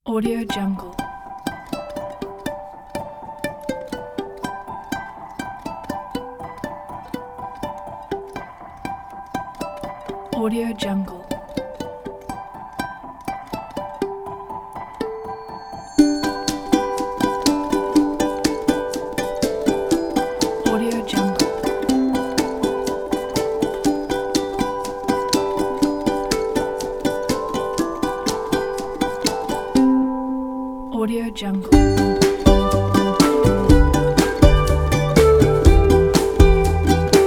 cute acoustic

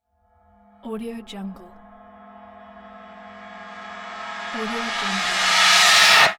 reverse cymbal whoosh

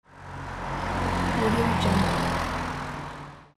car

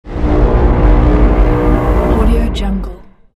cinematic horn

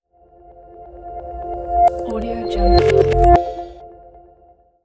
glitch ambient logo; audiocellstudio

